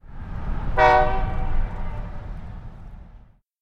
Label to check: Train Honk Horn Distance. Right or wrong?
Right